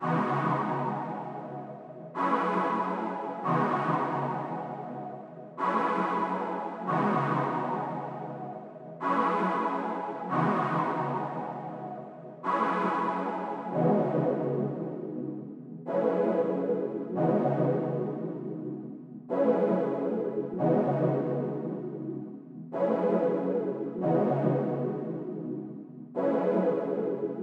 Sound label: 140 BPM C# Min Pad, melody loop stem; Loop Stems; Cymatics; 2022 Melody Collection; Wavy; Drift Away